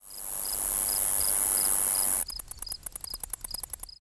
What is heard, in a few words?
grasshopper